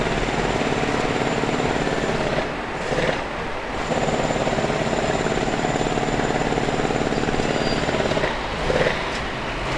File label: CONSTRUCT, CONSTRUCTION; 1000fx